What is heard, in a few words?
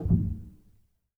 rel, Grand Piano; bitKlavier Grand; Piano Bar